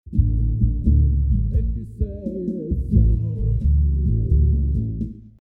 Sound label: bass